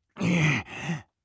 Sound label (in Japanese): ぐっ…！